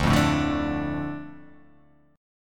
D Minor D, chord

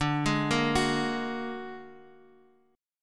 A sharp Augmented 7th, guitar chord

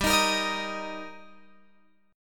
G Minor Sharp 5th G Guitar DADGAD, chord

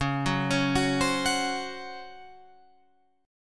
Db Major 7th Suspended 4th Db down Guitar Open F; arpeggiated

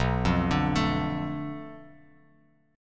B Minor 7th Flat 5th B Bass Standard, chord; Bm7b5; arpeggiated; 7,8,12,14; JGuitar

